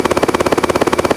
Helicopter

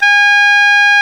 Oboe C4

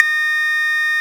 Cowbell 808

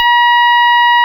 EP C4

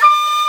Shakuhachi G4

